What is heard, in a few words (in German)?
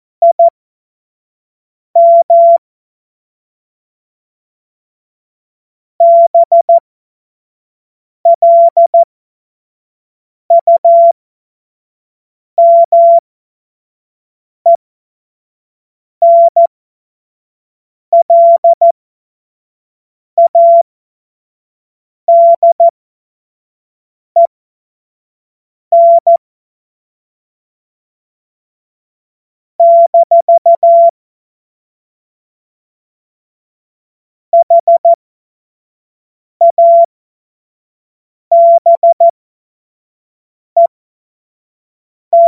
Witz, Morsekurs, 25 F70; Witze